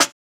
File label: Snare, JUNGLE BREAKS; ORIGINAL JUNGLE BREAKS; JBK DRUM SOUNDS; JBK SNARES